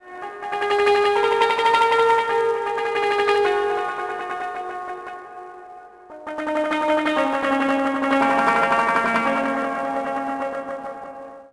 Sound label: koto; Splashdown; XBOX; Soultree; Tokyo